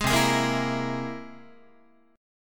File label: C Augmented 7th, Guitar; strummed; JGuitar